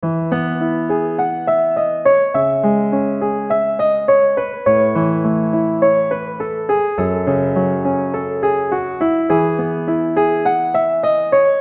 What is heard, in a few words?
Light Ringtone